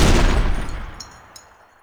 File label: flakvierling ringout; WAR